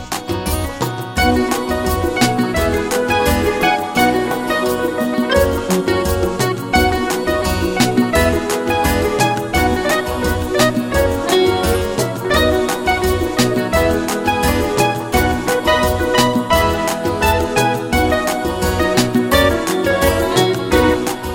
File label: Limbo Easy